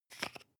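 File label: toothpaste open twist hard, Bathroom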